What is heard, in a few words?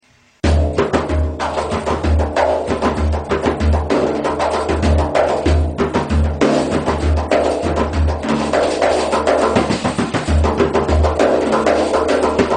bendir; instruments